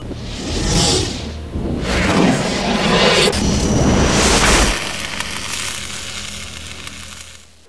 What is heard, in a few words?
timejump